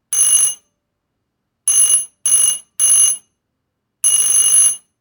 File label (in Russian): zvonek